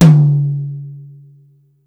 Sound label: Toms, Real